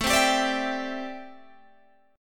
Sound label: A Minor 11th A Guitar Open G